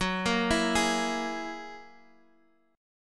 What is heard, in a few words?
F sharp 9th, Guitar, Half Step Down